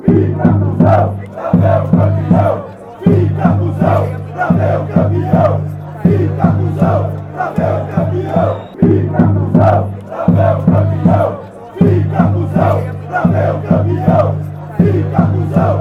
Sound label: indian noise